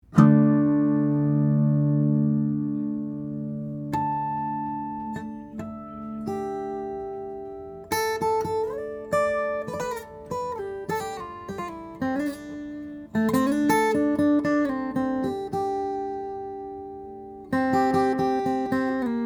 OM, steel string guitars flattop; Jang; multiscale; amazon rosewood; adirondack spruce

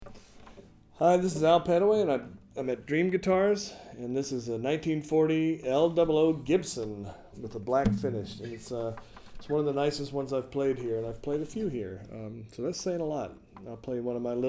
gibson l-00, steel string guitar flattop

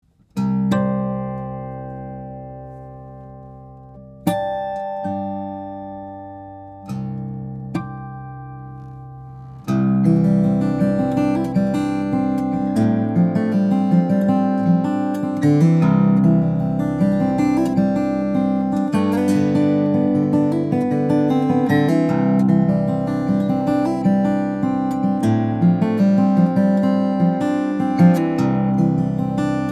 Grand Soloist, steel string flattop guitar; brazilian rosewood; redwood; Ryan Nightingale

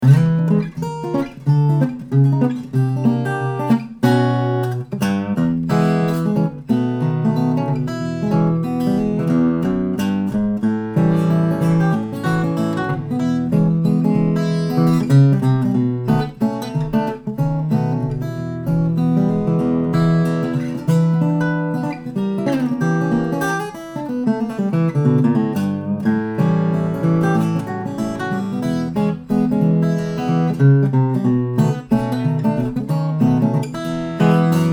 steel-string guitar, flattop; journey instruments of420

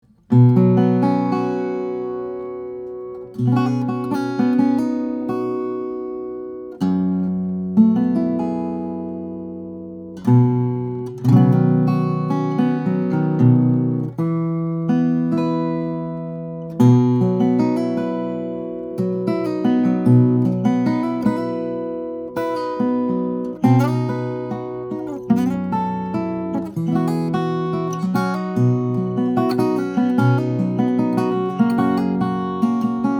Martin 00-42SC; cocobolo; sitka spruce; flattop

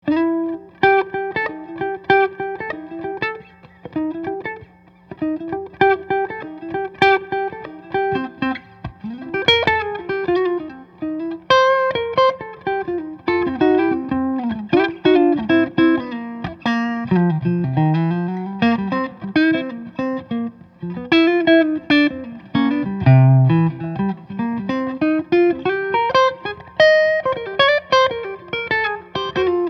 Gibson ES 150, archtop guitars, electric; maple; spruce; amp; Charlie Christian

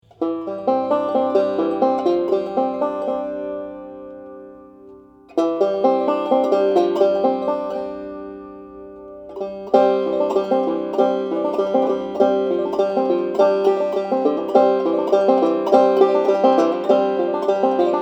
banjos; nechville; resonator; phantom athena; walnut; bluegrass banjos

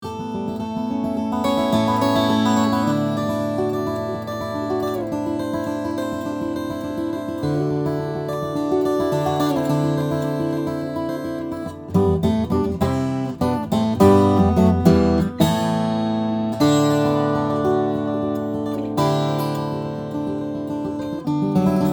12 String, guitars; rainsong; n-jm3100; carbon fiber hybrid; spruce

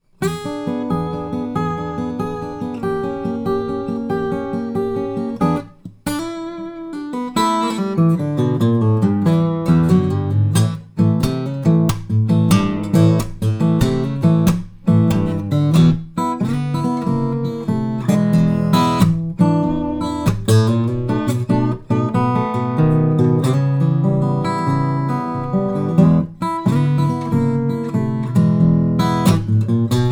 g1, steel string guitar flattop; greenfield

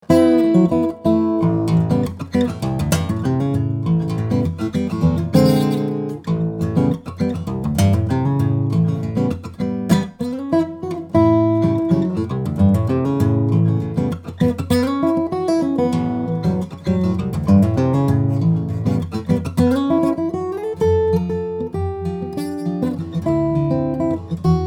baritone, Baritone East Indian/Sitka; 2008 Goodall; steel-string guitars; flattop; James Goodall

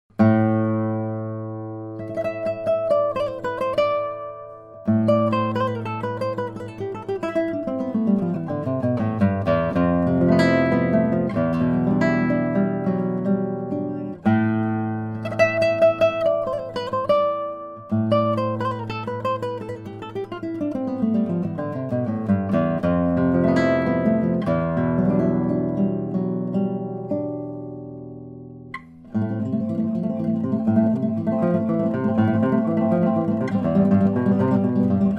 monrad double back; monrad eric; nylon string guitars; classical guitars

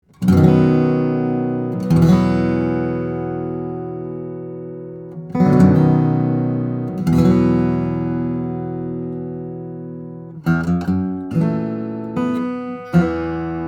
Baritone, steel string flattop guitar; 2000; santa cruz; professional; koa; european spruce

